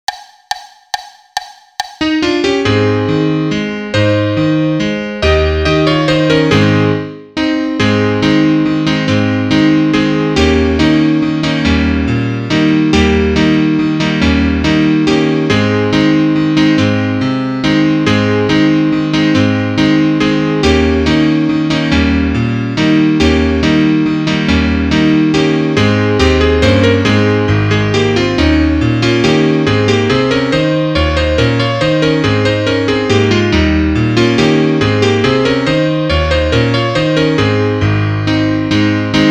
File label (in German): Klavier Solo, trompete; Ländler; (Duett)